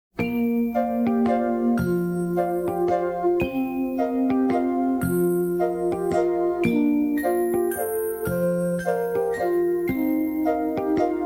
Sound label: Steamwhistle Waltz SLOWER WITHOUT melody fade